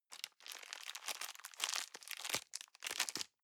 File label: plastic bag small rustle long